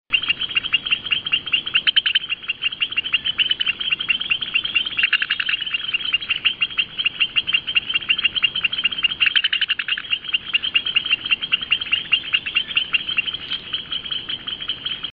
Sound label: leptodactylus albilabris carolina puerto rico; A loud chorus of its "pink-pink-pink..." / calls; West Indian